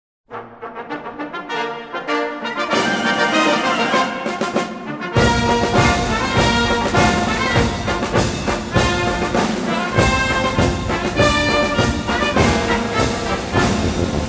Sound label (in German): Marsch